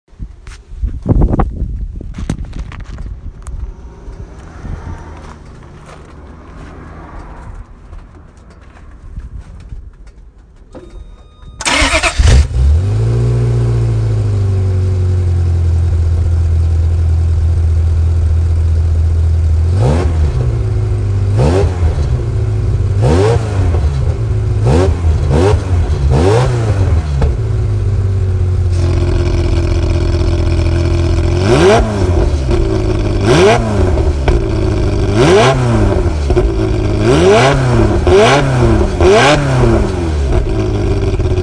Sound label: F355 GTS